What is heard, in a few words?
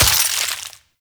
Vial Ability Ice Shatter; Warframe; Warframes; Alchemist; Elements